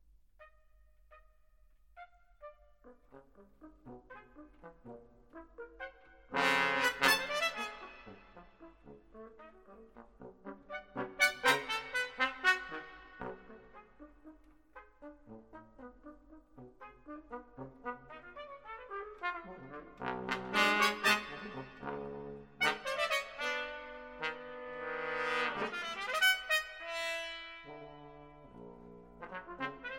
Brass Quintet - II. Caprice

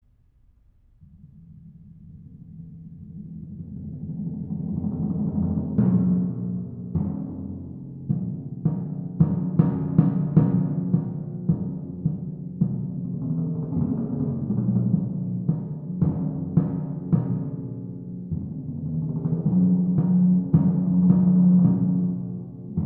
Double Timpani Concerto, Interlude

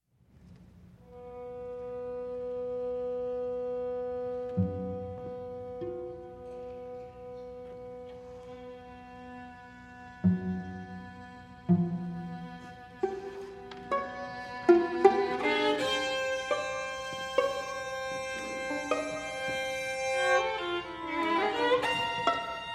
String Quartet No. 2 Andante; Walker (George)

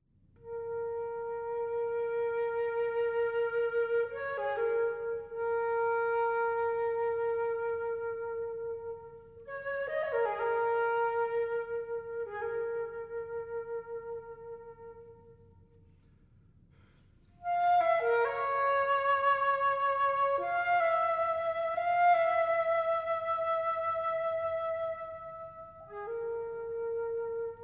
For Flute Solo

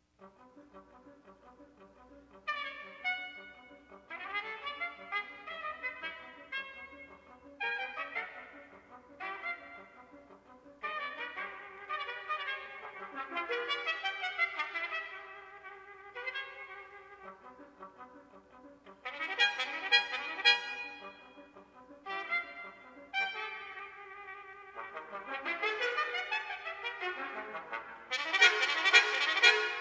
Suite For Brass Quintet, Scherzo

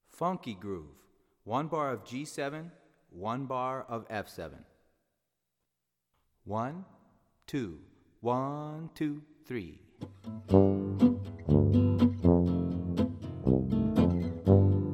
Funky Groove G7 F7

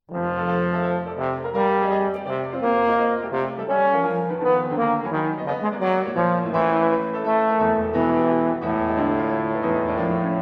Sonata For Trombone & Piano

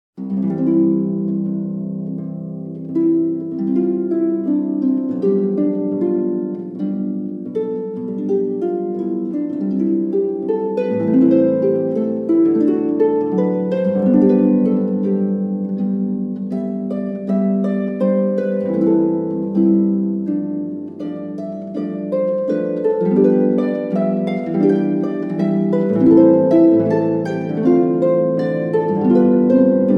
Andante from Violin Sonata, Harpworks

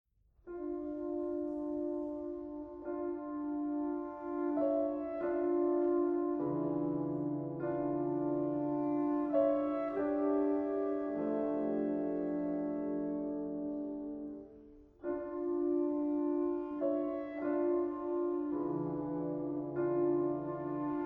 Piano Concerto - Mvt. 2. Calm and serene

